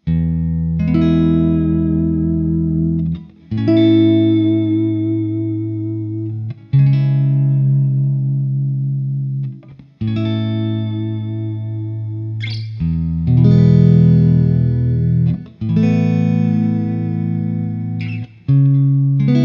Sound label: strat neck, guitarras